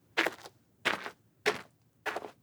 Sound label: Walking